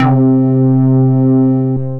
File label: Snap; Stray Cats Collection by Max for Cats; MSE Presets